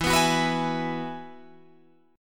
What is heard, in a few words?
E Major Add 9th, Guitar Drop D